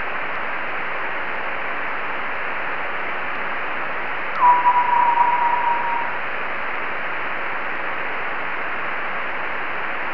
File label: leonid echo